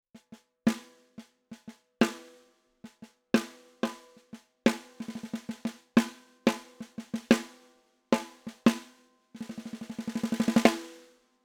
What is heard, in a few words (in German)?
Snare Drum Niere; sE Electronics T2